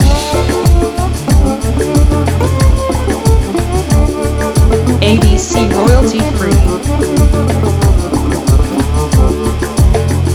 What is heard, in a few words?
Slide Groove shorter distortion